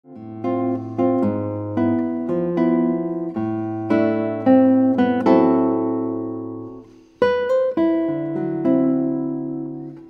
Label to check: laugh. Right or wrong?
Wrong